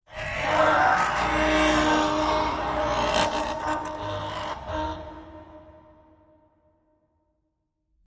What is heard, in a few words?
evil glitch